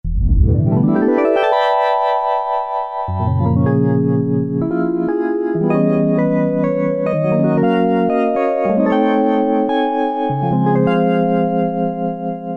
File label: Vibes, Korg Poly-61M